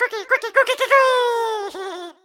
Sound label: block voice; guodongrenwu